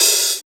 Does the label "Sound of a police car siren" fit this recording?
No